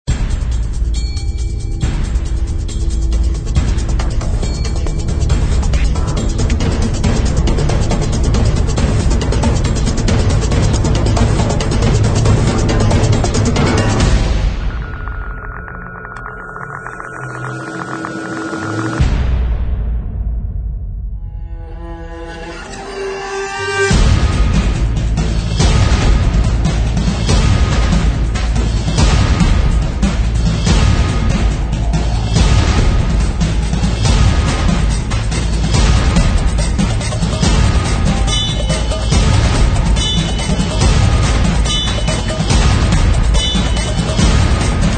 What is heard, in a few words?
Epic Action Trailer Non Choir Ending